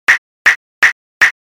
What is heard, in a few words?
bpf decay